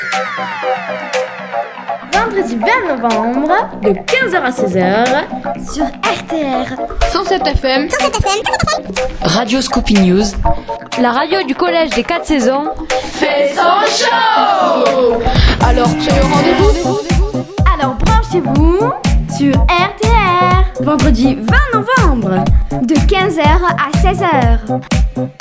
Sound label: jingle